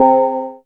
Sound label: DRONER MID, ANALOGICS; Back In Time Records - Big Bang